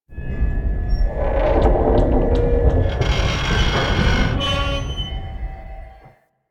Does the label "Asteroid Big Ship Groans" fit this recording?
Yes